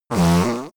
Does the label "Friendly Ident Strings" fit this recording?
No